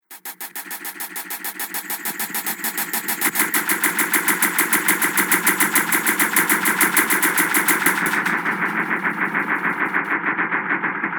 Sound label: Static; Ghost Sound Effects; Horror Sound Effect